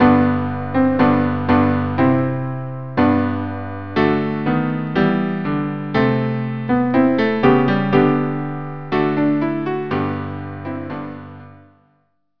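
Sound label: cello piano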